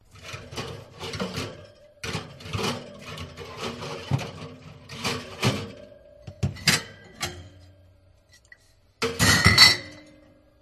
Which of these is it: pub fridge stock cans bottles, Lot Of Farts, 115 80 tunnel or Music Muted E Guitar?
pub fridge stock cans bottles